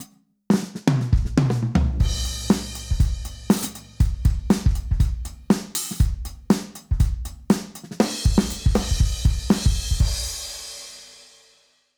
drums enhanced; modular effect board; sonic instruments

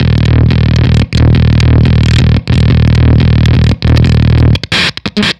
drum preamp, Fuzz test

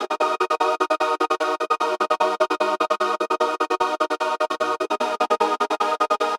techno chords dry; wavefield

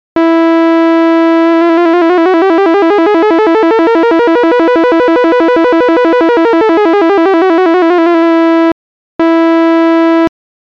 Unipolar LFO

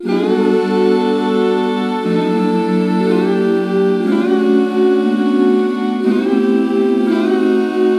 warm choir processed; kern audio

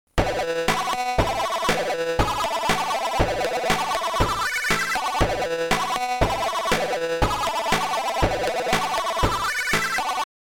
chiptune

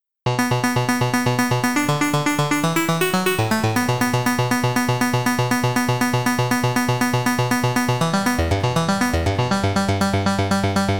arp keys dream; the transmitter soundset

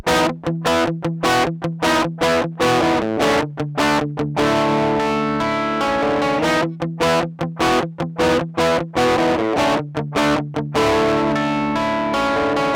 panama style, distraptor; tl distraptor; tonelib

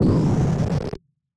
phase lazy distortion bomb; lo-fi digital 8-bit glitch fx; nova sound

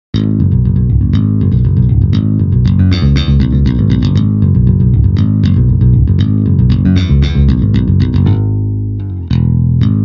pick bridge, virtual EII BTL MIDI rock bass; pfundstein audio plugins

